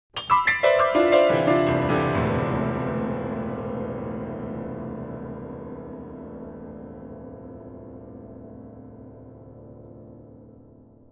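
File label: Grand Piano Descending Chords; keyboards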